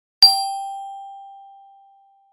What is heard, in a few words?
Glockenspiel